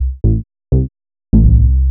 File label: HOUSE BASS 125 A